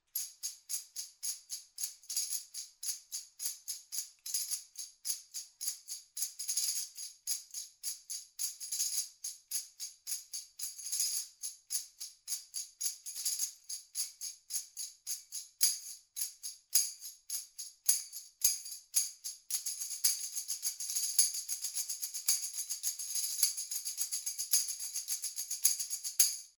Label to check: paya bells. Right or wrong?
Wrong